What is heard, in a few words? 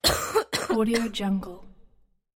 human cough single female